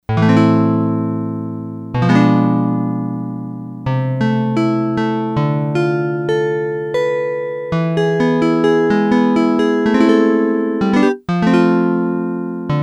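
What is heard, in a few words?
Folk Guitar, Yamaha DX100; Factory Patches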